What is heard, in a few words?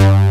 MGP BASS; Pro-Rec - Synthsonic; JV ANALOG